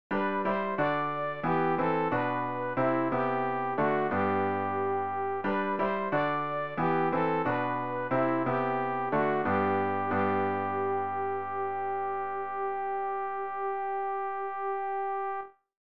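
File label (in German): sopran, Übehilfen; Ref. Gesangbuch; RG 194: Kyrie, kyrie eleison (Taizé)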